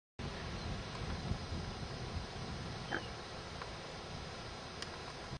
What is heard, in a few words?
adult flight call; inverness